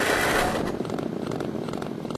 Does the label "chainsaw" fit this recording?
Yes